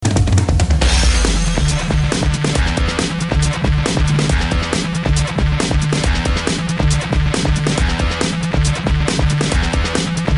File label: Def beat; cstrike